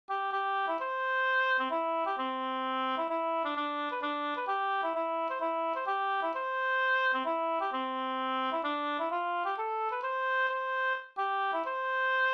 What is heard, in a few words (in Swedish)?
hambopolkett; Vastmanlandspolketten e Axel Axelsson Sd